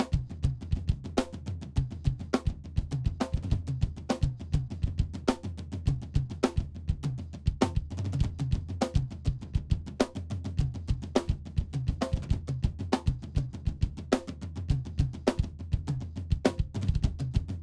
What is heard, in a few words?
drums phase; melda